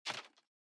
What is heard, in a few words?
furnace Wood; out of reach treasure royale 2020 gamerip pc